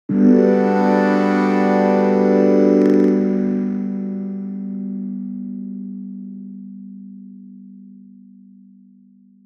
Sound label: Trance Rise Up, jup8